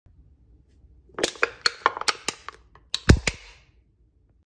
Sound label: Crushing a soda can; مچاله کردن قوطی نوشابه